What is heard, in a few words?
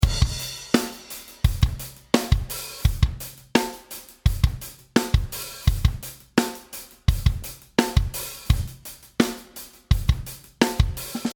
Drumbeat American Comp